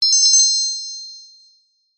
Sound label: fantasy magic chime ping wand fairy godmother